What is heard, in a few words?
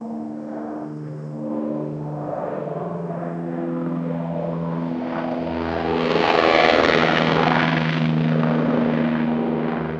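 helicopter flying overhead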